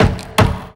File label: Move